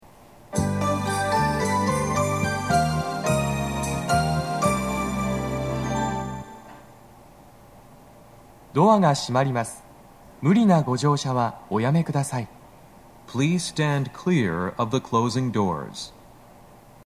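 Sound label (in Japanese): 発車サイン音, 待合室; TOA弦型での収録です。; TOA円型での収録です。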